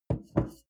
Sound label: bowl place on table